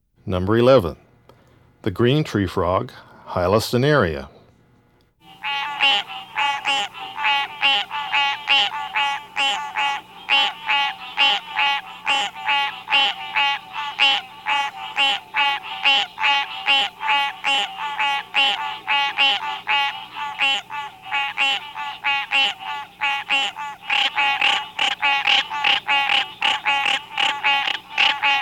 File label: green treefrog call; Texas; amphibian watch; texas nature trackers